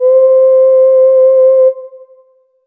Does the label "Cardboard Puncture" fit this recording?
No